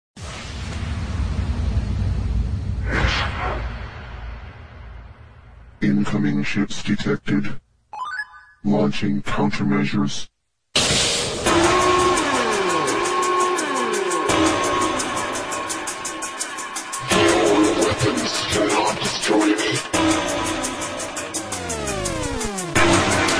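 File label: drum and bass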